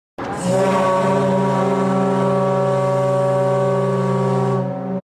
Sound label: horn whistle; titanic